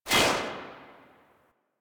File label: Rifle Shot A; Weapons; Warframe; Tenno; Bard Rifle